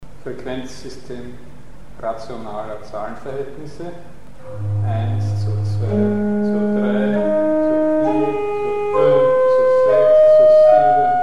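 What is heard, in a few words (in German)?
Rationale Frequenzverhältnisse; Mikrobuch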